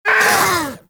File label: Vocal Pain; Hunting Critters; Vulpaphyla